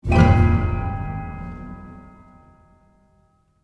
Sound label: Scary Orchestra Sound